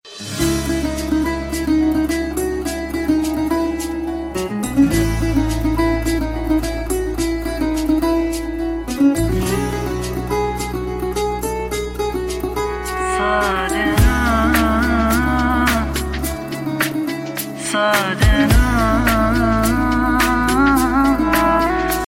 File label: guitar ringtone